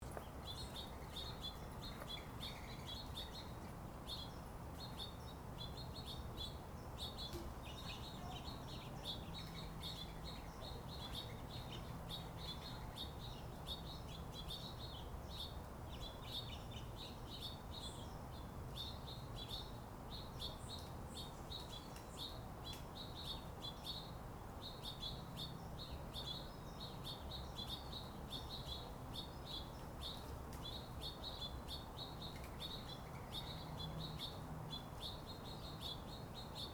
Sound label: bird feeder